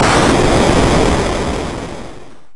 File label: explode